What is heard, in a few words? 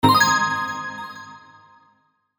open bling